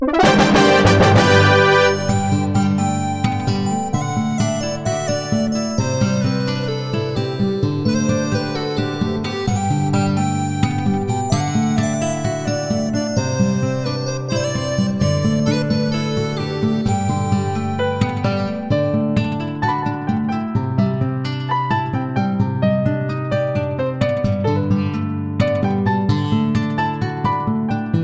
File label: Battle Won; Adventure Time Hey Ice King Why'd You Steal Our Garbage